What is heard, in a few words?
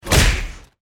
human punch hit exaggerated